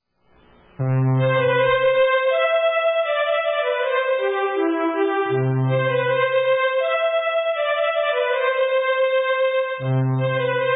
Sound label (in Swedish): polska